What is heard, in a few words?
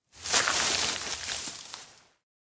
grass step sway forest; anemoi